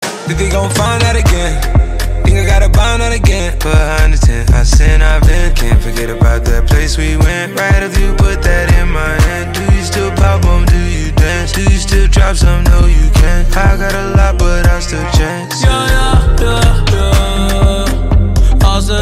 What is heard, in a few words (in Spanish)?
K POP, tono de llamada